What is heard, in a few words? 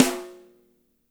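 piccolo snare; Spectrasonics; Burning Grooves CD2; DRY KIT 4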